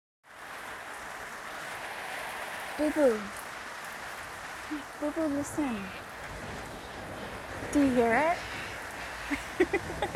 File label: Aussie Outdoor Ambiance, Rain and Thunder; F4A; Storm Watching; GFE; Watch the Rain with Me; I Love the Rain; Giggles